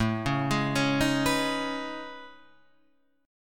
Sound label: A Major Sharp 11th; AM#11 Chord {5 4 6 6 4 7} arpeggiated